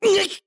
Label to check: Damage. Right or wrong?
Right